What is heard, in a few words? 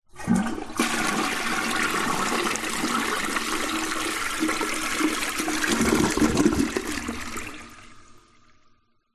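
Public Toilet Flush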